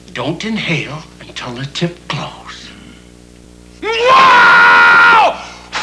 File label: inhale